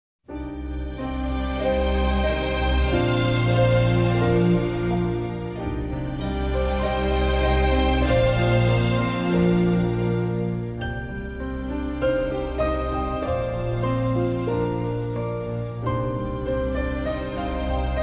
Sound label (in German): Harfen